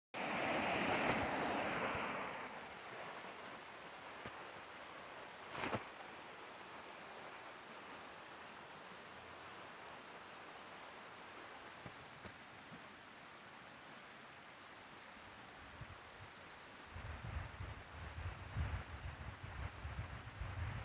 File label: falls sledding hill; berea